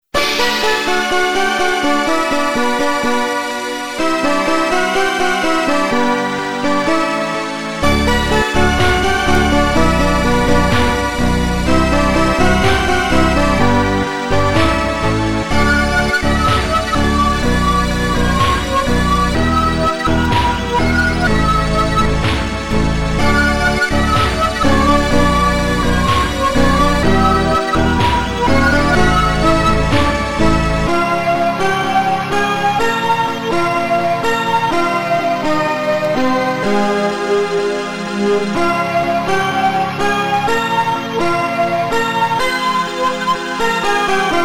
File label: High Score, Amiga; Atomino; Moonchild